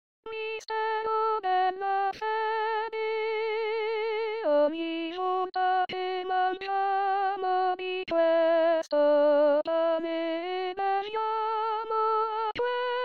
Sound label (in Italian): Mistero Della Fede Soprani; anno liturgico